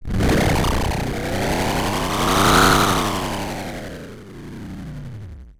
Science Fiction Sci-Fi Electronic Distortion Pulsing